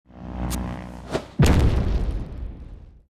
Skill Dynamite

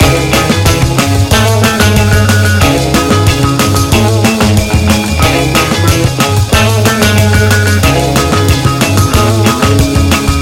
Rush Hour Loop; Rush Hour 30s To 10s Loop; Burnout CRASH!

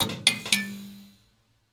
Intro Server Room Lights On

